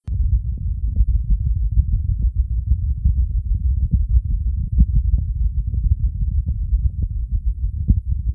PAROXYSMAL VENTRICULAR TACHYCARDIA, heartsound; Heartsound Library

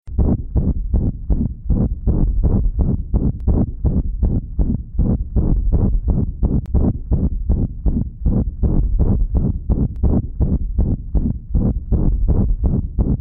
grade 6, heartsound; Grading; Heartsound Library

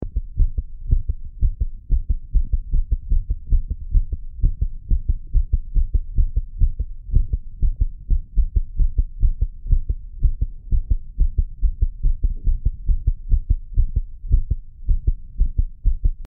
Grade 1, Heartsound; Grading; Heartsound Library

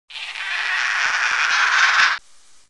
strong aggression call, Fledermäuse; Kommunikationslaute; emotionale Rufe

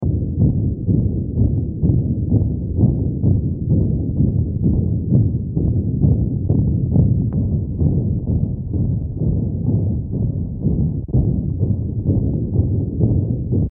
Border Collie, Heartsound; Murmurs of common canine heart diseases: Digital heart sounds and phonocardiograms; Heartsound Library

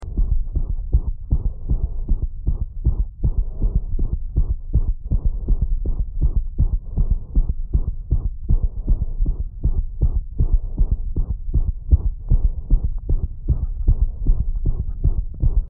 Grade 4, Heartsound; Grading; Heartsound Library

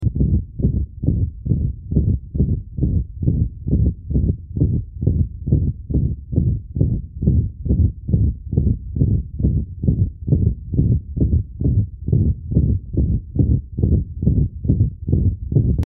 Grade 5, Heartsound; Grading; Heartsound Library

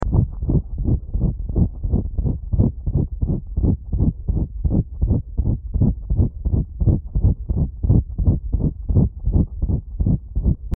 SINUS TACHYCARDIA, Heartsound; Heartsound Library

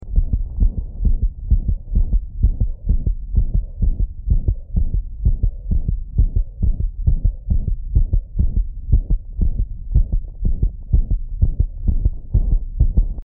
Grade 3, Heartsound; Grading; Heartsound Library

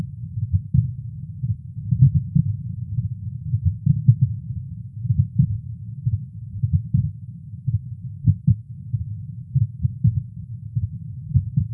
3RD DEGREE AV BLOCK, Heartsound; Heartsound Library

